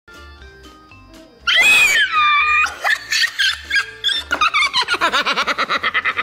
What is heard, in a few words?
kid laughs; donut burger